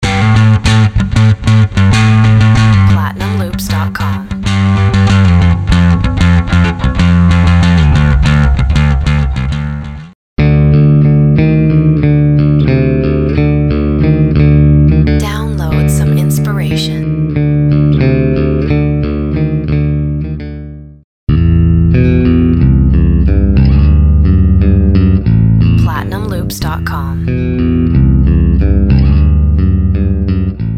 nu metal bass

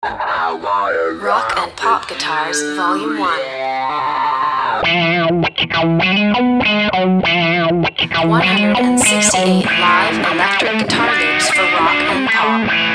rock and pop guitars

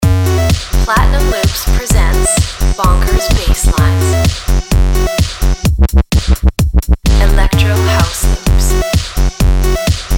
basslines

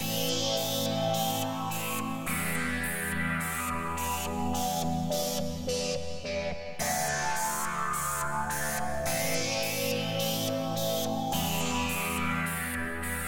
chord sequence audio

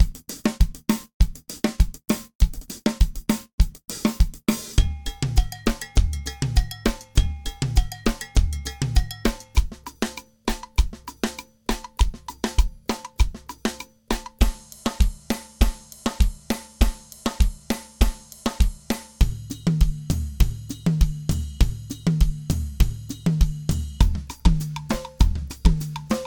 reggaeton live drums mix; live drum sound

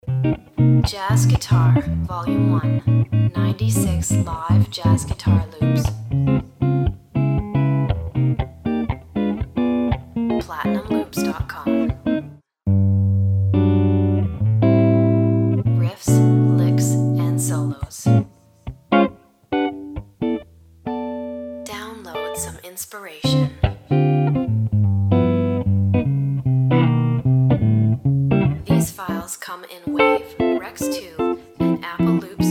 jazz fusion guitars

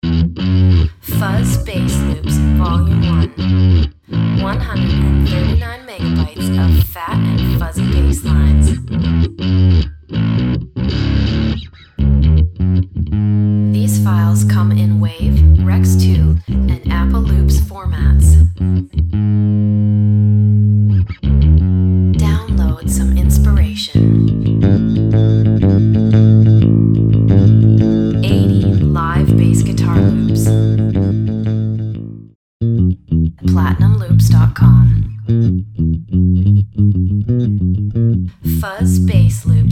fuzz bass loops

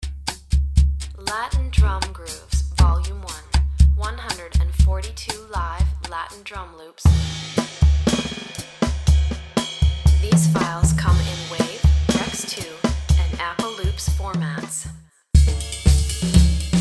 latin drum grooves

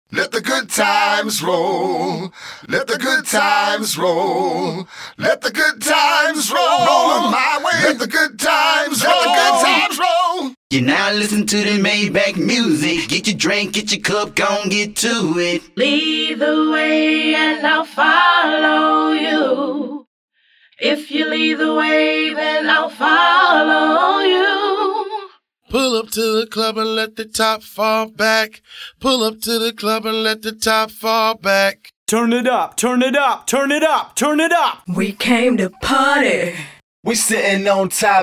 pop vox mix

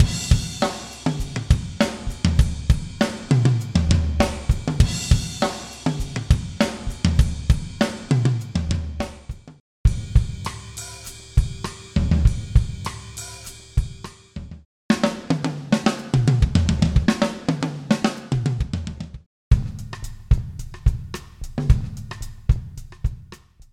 rock steady drums; live drum sound

